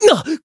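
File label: damaged, Japanese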